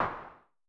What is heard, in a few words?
SN Filtered, Vermona Mono15 Drums Snare